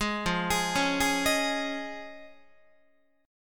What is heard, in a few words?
F Minor 7th Sharp 5th Guitar, chord; Fm7#5 arpeggiated; 4A,4E,5A,5D,5G#,6C#; 11,13,11,11,12,14